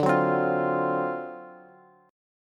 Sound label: D 7th Sharp 9th, Bass; strummed; JGuitar